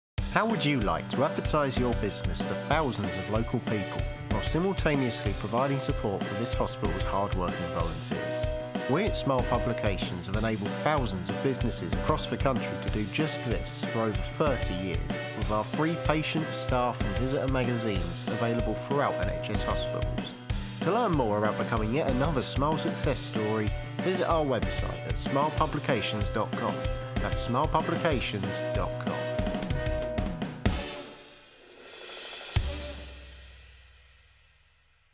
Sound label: Smile jingle